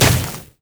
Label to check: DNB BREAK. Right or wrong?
Wrong